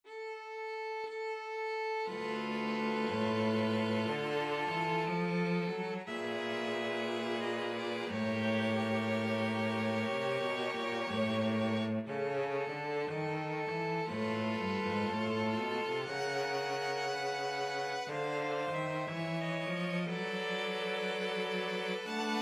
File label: happy jazzy birthday, string quartet